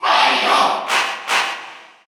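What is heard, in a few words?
Cheer Russian, crowd; Wario; SSBU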